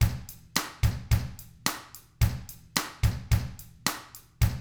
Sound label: 109 POP; ILIO; Double Platinum Drums 1